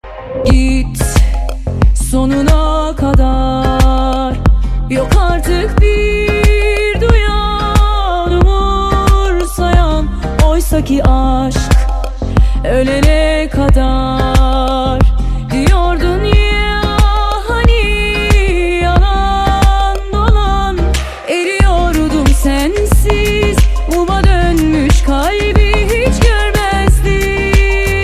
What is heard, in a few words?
Ringtone Singer